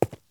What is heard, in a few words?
Cement Foot Step